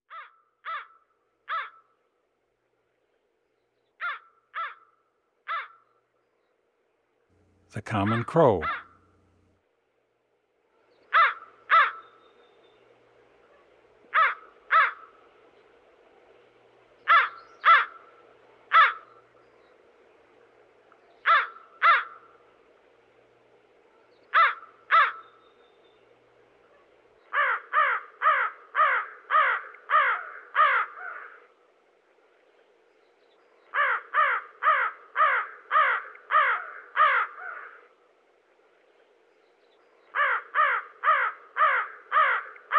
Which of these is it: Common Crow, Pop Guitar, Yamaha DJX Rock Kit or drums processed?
Common Crow